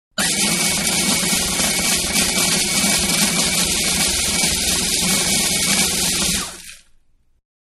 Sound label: Food Processor Dry; Food Processor 1-Dry Ingredients; Food Prep; Restaurants; Manhattan Production Music